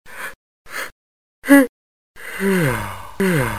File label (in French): Baillemment, Maladies; bruitage